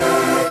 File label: RAVE, RAVESYN